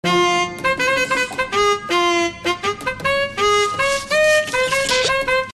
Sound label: doot doot; Age of Empires II HD